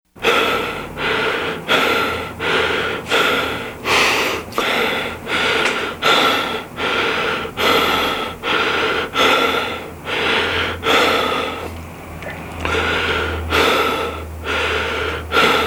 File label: Heavy Breathing; Halloween Sound Effects